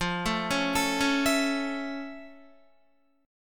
F Augmented Major 7th F Guitar; F+M7; arpeggiated

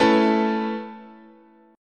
A Major Add 9th, chord

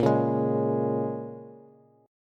Bb-Minor 7th-Bb-down-Bass; Bbm7 strummed

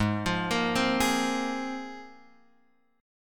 Ab 6th Add 9th Ab, Guitar, Open F; arpeggiated; JGuitar

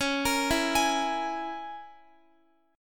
C sharp Minor 6th C sharp down Guitar; C#m6 arpeggiated

